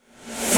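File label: snare reverse, DnB Breakz Kit; Breaks N Beats Sound Kit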